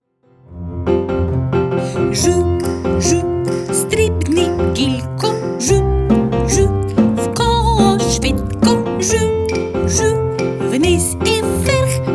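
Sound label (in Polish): pszczółka ukraińska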